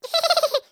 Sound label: Laughing Mouse Squirrel Cartoon